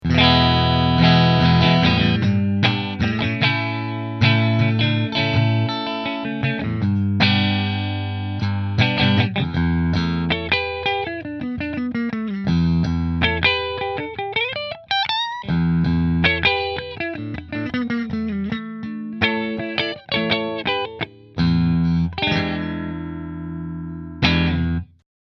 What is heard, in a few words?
Nola Voodoo Middle Fender, guitars